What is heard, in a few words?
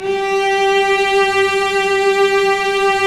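strings, mf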